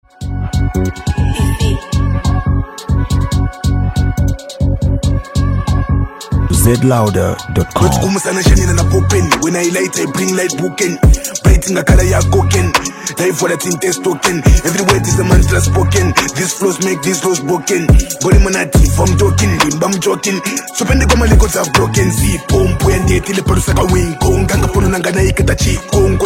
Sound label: Drums